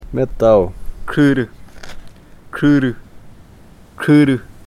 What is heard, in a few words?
metal